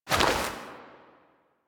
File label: air roll whoosh; characters; generic foley; Warframe